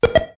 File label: Shell; SMB3